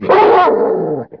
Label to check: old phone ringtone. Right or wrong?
Wrong